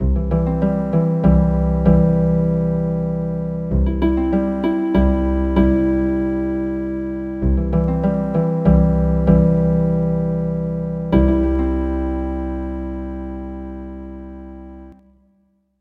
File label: Healin Harp